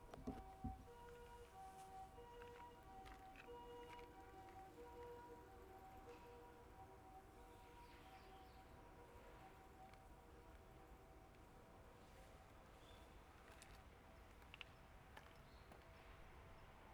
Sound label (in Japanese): 清瀬カーブ。 下り電車通過。 続けて上り電車通過。; 録音したファイルを、本体でノーマライズしました。; H2essential MS内蔵マイク指向性120°＋ZOOM ヘアリーウィンドスクリーン WSH-2e